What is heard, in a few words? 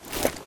pistol draw; STALKER; IWP